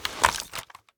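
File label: deselect, gp30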